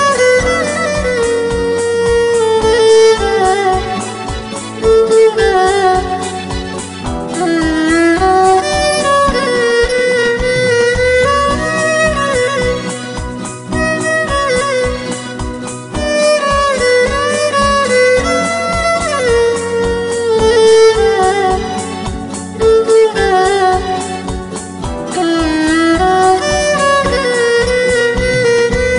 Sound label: bollywood instrument ringtone